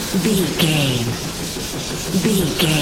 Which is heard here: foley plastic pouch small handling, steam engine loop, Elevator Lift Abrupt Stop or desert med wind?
steam engine loop